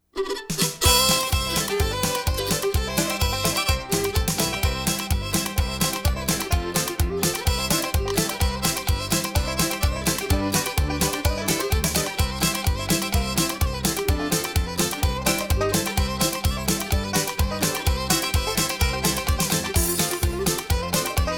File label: hoedown, square dance music